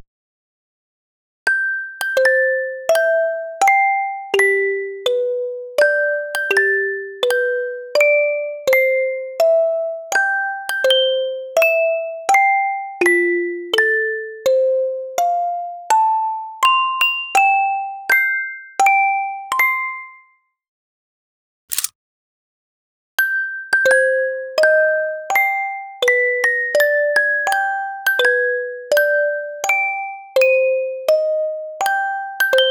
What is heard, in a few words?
Cursed Birthday, horror music box; HauntedBloodlines